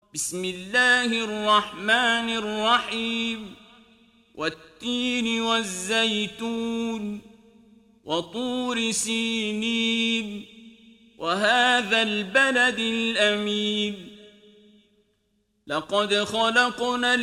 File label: At-Tin surah; abdulbasit